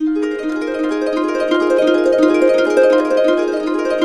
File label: EB, Harps; Complete Harps; USB Soundscan vol.62; AKAI